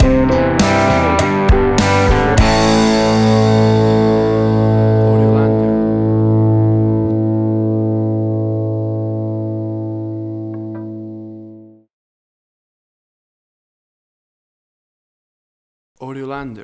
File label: Big Old Rock Stinger